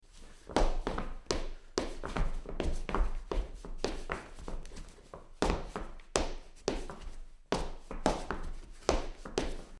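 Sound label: dancing the charleston, foley; dancing the charelston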